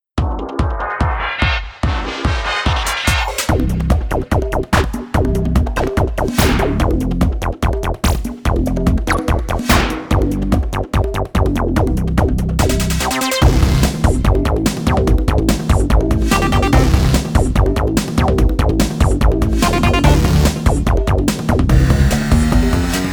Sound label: Heavy Machinery; crash bandicoot n. sane trilogy